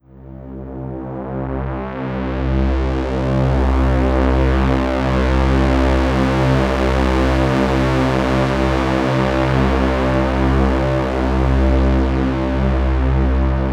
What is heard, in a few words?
Super Fat C, Analogue; Zero-G Ambiosis